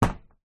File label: footstep single metal; foley; zapsplat